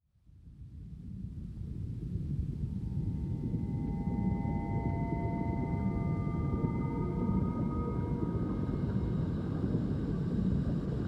Reef World ambience; Lode Runner On-Line The Mad Monks' Revenge

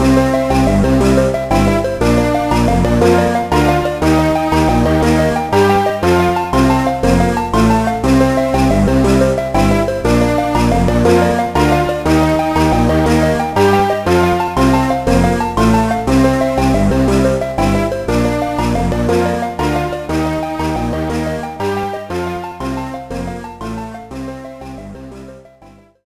Exellent Rating, gba gamerip; santa claus junior 2002

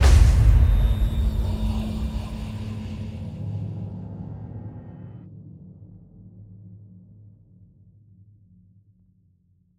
asylum ward intro door slam

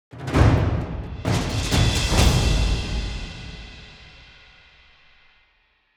Tournament Combat Outro; Toussaint - SQ701

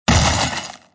Ice Asteroid Destroyed; Angry Birds Space

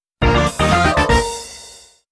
Mission Success Fanfare; mario superstar baseball gamecube ost

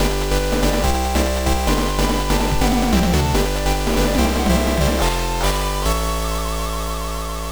Mission Completed

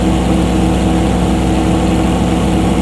Ford F150 High; car simulator 2

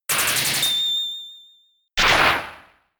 Spark

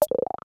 lame button; Gonner

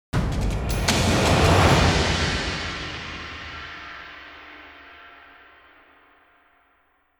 Combat Intro; The Witcher 3 Wild Hunt; Toussaint; Beauclair Castle

